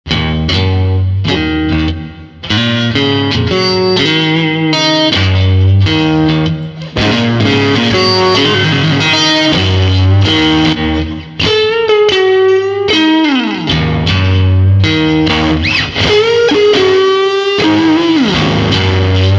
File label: fire bottle heavy boost; effectrode